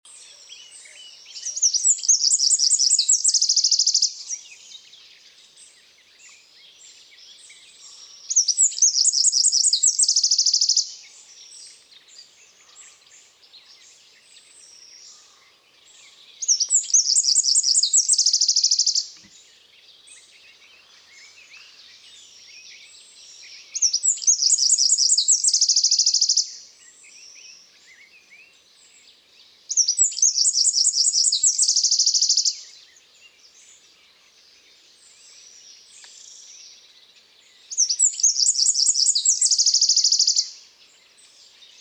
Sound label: Troglodytes troglodytes; SETE